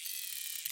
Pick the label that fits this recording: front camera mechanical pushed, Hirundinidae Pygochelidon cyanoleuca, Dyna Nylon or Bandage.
front camera mechanical pushed